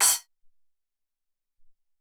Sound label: Spl Choke, Alesis SR-18